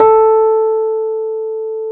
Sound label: E PNO, KEYS; Synclavier Sampler Library